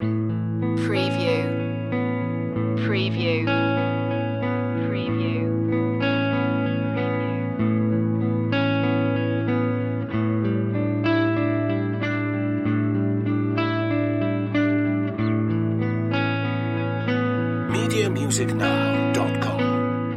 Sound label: guitar dreams loop